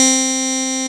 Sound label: Pulse high; instrumente